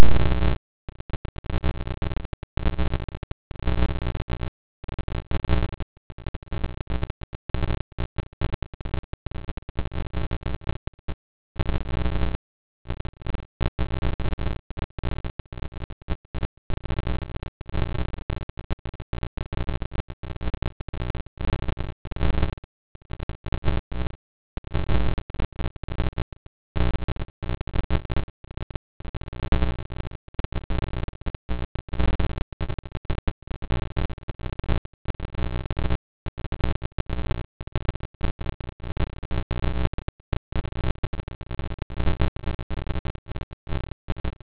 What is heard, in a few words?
Pulsar Observation; 20m; B1641-45; Skynet